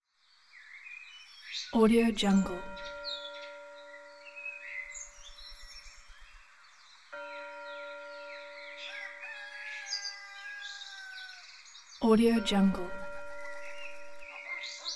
village with church bells

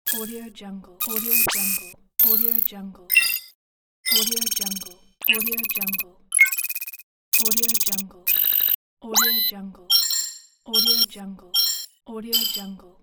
futuristic digital interface elements

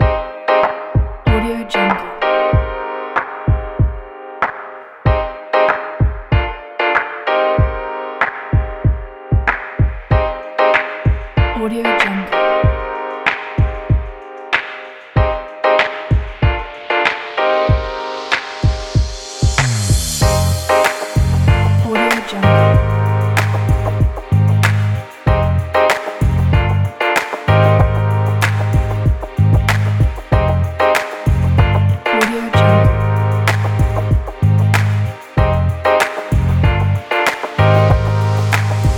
fashion lounge rb soul kit

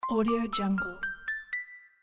cartoon xylophone ascending

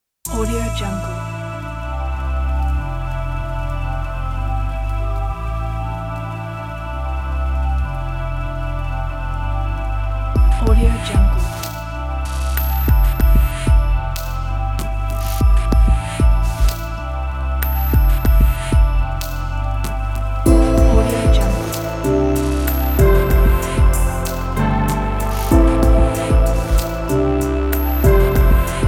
ambient background meditations

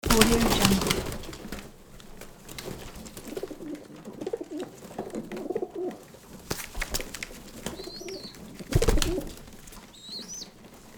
pigeons

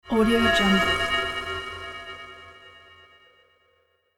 dark realm horror asset

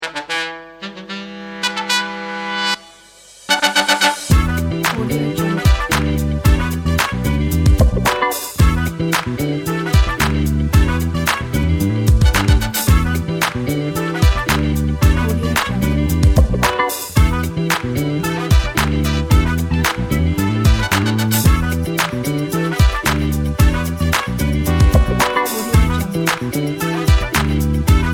summer urban funk

